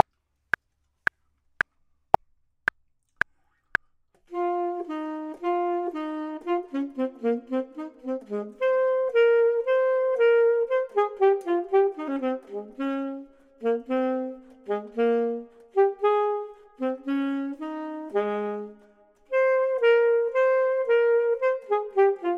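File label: bottom, Saxophone and Clarinet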